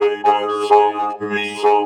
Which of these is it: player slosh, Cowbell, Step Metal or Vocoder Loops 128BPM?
Vocoder Loops 128BPM